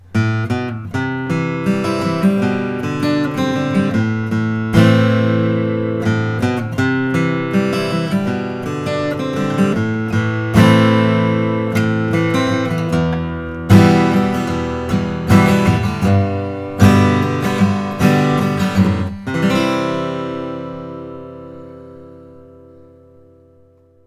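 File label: bourgeois oms 12 fret german spruce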